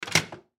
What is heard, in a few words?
Arcade Game Gun, Arcade Game Shotgun Remove From Holster; Technology; Arcades; Blastwave